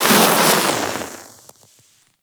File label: Fire Swing, Hammer; Warframe; Grineer Hammer Boss